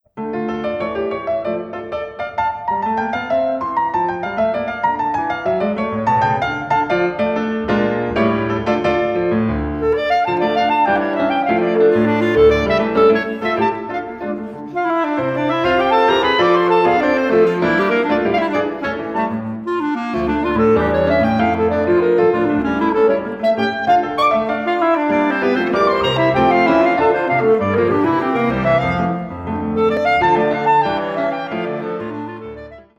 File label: Quintet Contradanza